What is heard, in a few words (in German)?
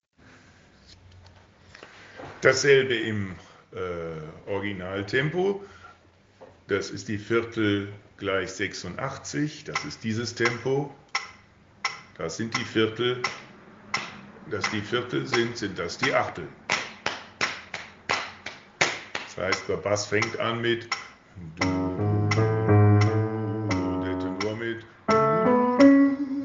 Engel Alt originaltempo; Uebedateien